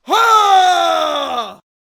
Boxer Attack Vocals

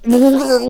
Ballista Death Vocals

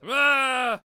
Brawler Attack Vocals

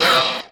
Raptor Death Vocals

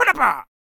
Knight Attack Vocals

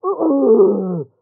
Death Vocals; Executioner

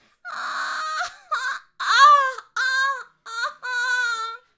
Pumpkin Catapult Death Vocals

